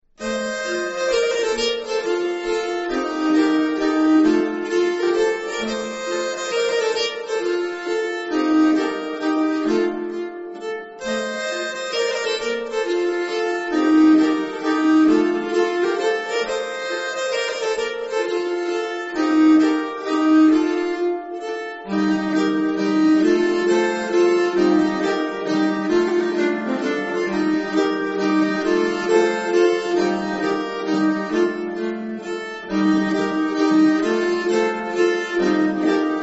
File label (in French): mazurka d'automne, nyckelharpa